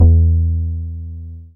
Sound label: BAS FM DX-7